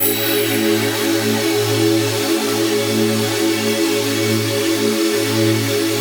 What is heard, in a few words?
Oceanic Pad maj